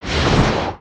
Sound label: Swim, foley